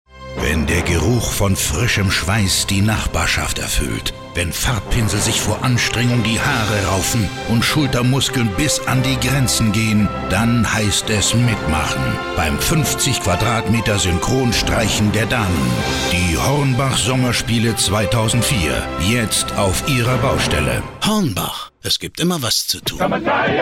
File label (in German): Synchronstreichen